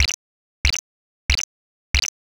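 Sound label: footsteps grass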